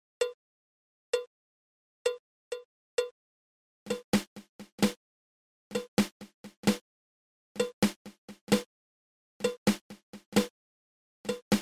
Flamacue

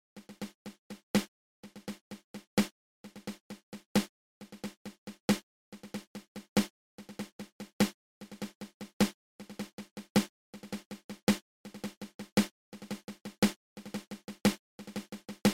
Single Ratamacue open close open

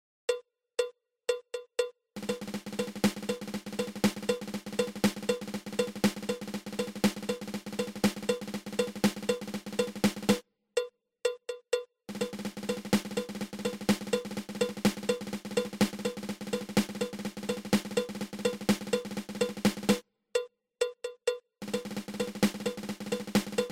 Triple Ratamacue

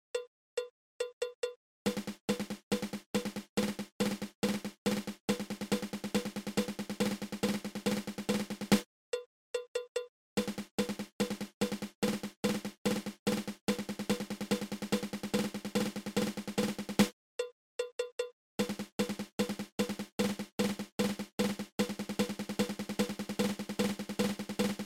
Single Dragadiddle

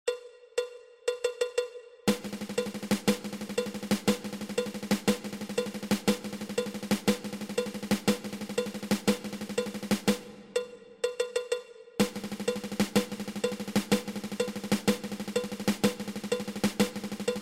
Ten Stroke Roll

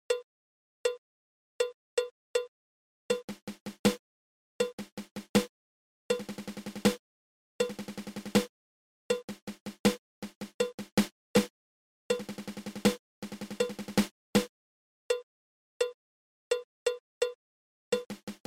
Nine Stroke Roll

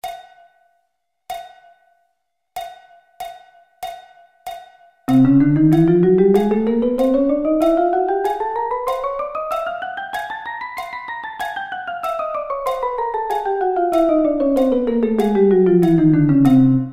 Chromatic Scale

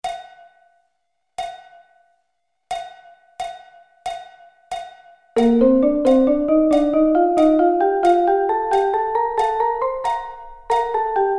Bb Double Sticking

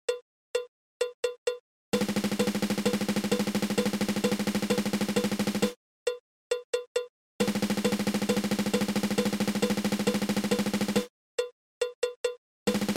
Triple Stroke Roll

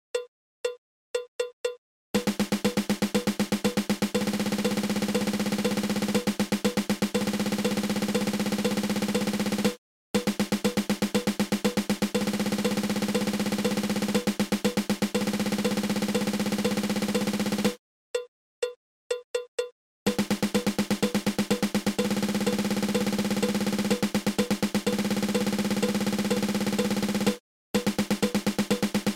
Double Stroke Roll

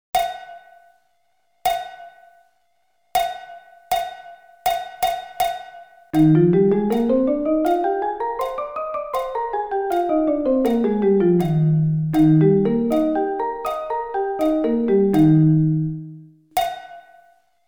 Eb Scale, 1 oct

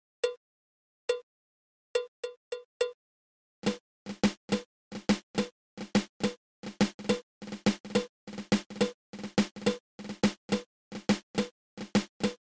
Double Drag Tap

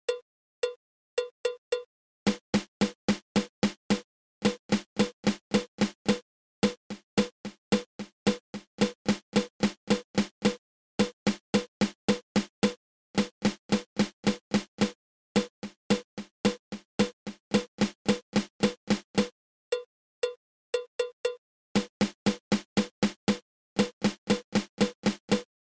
Flam

